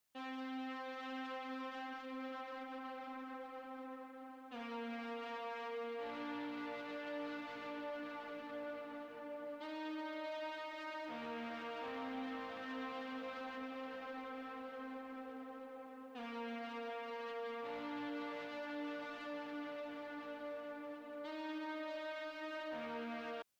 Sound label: 165 BPM C Min Brass Lead; Reverse; Stems; Cymatics; 2022 Melody Collection (BETA); Fear And Greed